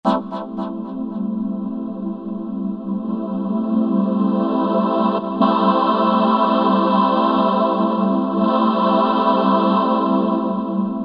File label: SYNTH TEXTURES; Fiji Afterglow; Apple GarageBand 2